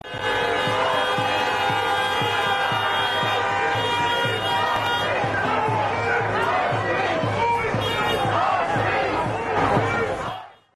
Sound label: Crowd, Honking And Chanting; MN Police Shooting